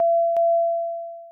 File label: Alert double